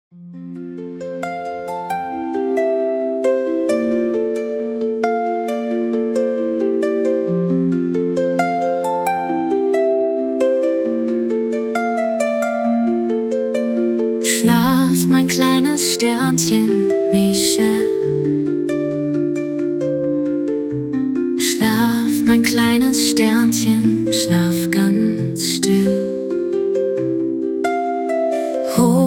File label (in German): schlaflied